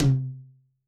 Tom, drum

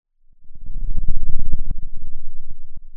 pop, Sound of a thunderstorm

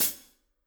ClHat, drum

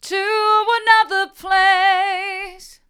to another place hard, vocal

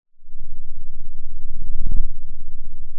pop

